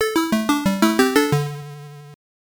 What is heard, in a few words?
Level Start; Chuck Rock II Son of Chuck; Game Gear